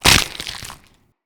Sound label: Arm Break; Characters; Finishers; Tenno Alt Hip Throw